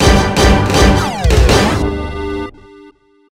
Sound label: game over; tf2ware ultimate; music game; 3ds